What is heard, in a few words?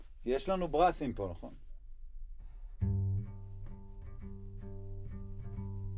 Bass Bot